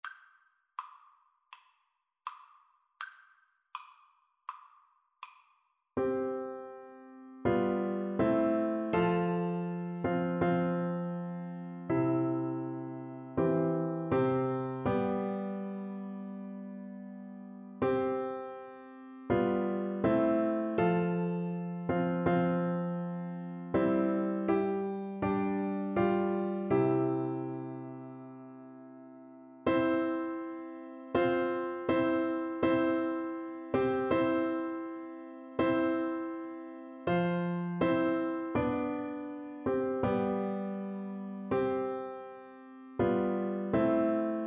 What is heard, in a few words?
nearer my god, Double Bass